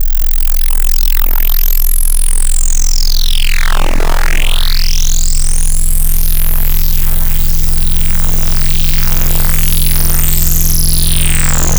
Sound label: Electric, wavetables; Cymatics; Wavetables Collection Vol 3; Serum